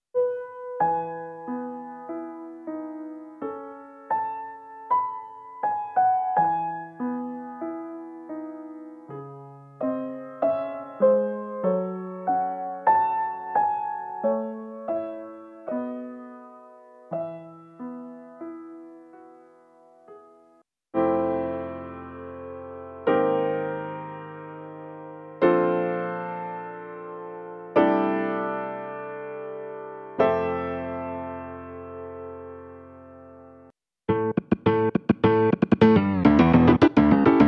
Klangbeispiel Addictive Keys Upright; XLN

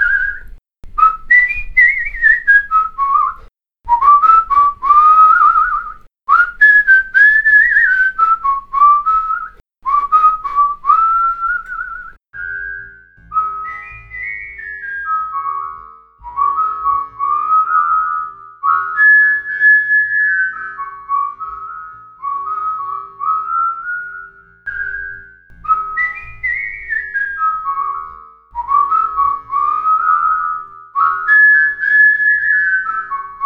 Moodal Test Pfeifen

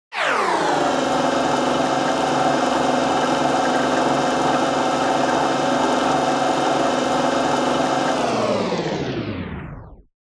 TAPE MELLO FI Motorgeraeusch und Tape Stop; ARTURIA

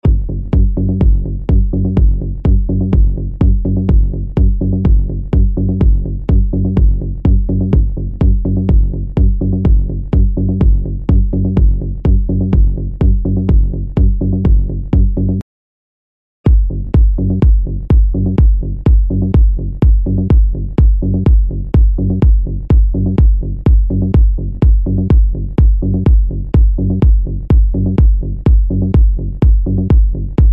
Klangbeispiel SideKick5

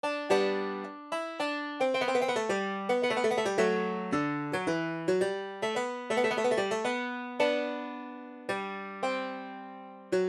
Purcell alle Variationen

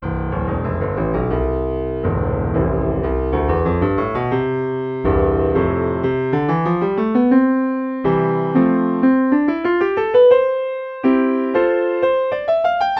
Lauf Grand Piano

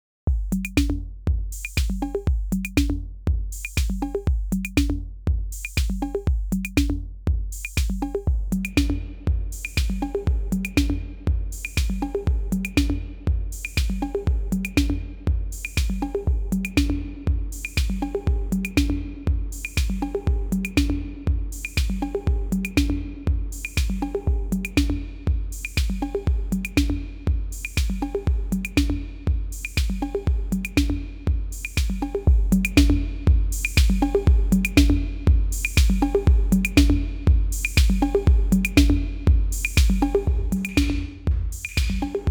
Klangbeispiel REV PLATE-140; ARTURIA

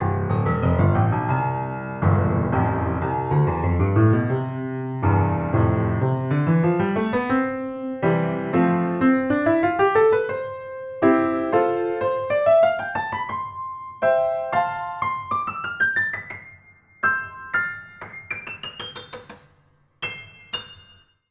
Lauf Steinway

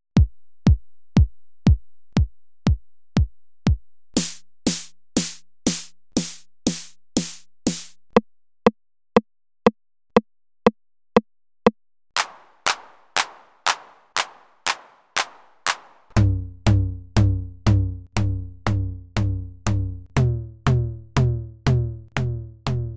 Klangvergleich DRUMAZON 1 vs DRUMAZON 2; D16 GROUP

